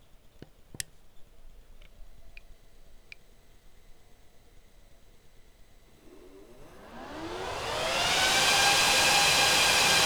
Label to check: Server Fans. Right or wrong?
Right